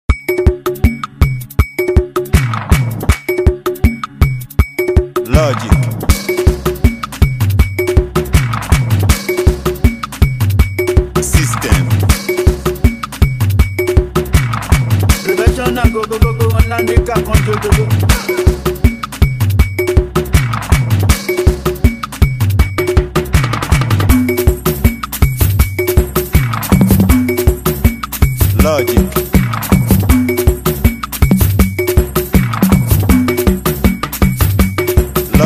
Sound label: Professional Beat Ogba clap; Bazehits